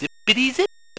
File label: zippity zip